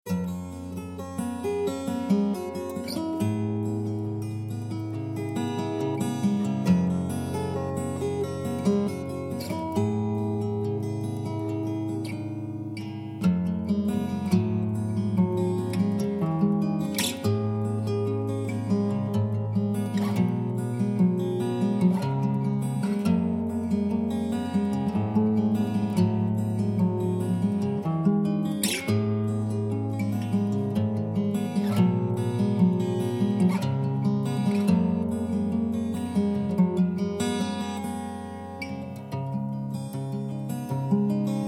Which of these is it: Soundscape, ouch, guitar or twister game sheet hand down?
guitar